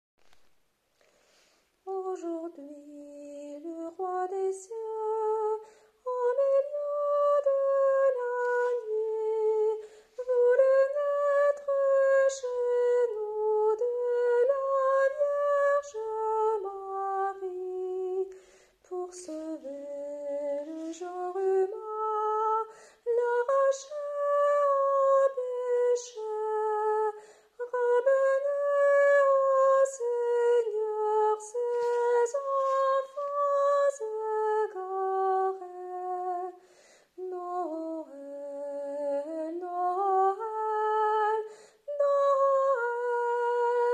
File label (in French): Aujourdhui le Roi des cieux soprano